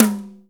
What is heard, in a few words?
TOM, Drum Dry; East Collexion; Drum 1